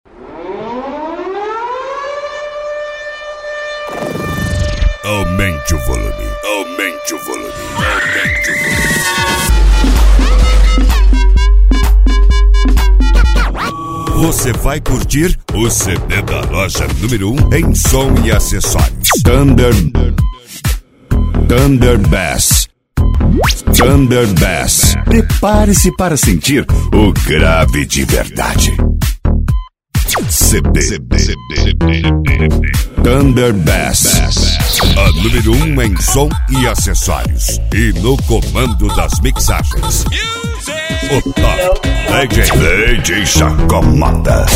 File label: abertura thund bass